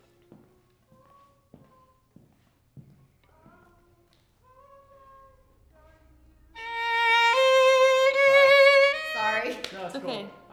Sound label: violin